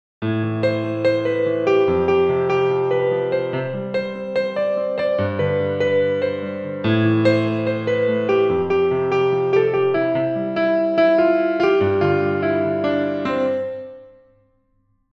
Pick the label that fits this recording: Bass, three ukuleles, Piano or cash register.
Piano